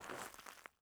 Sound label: footstep trainers gravel compact walk slow; Phasmophobia